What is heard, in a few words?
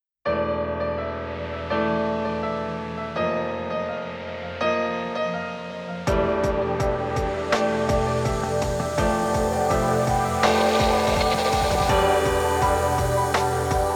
Full Off Vocal Vers; JPN; Steins Gate; GAME PSP